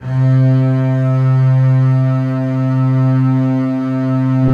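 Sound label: basses sustain c3; Sonatina Symphonic Orchestra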